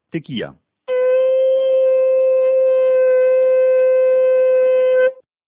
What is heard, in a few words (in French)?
tekia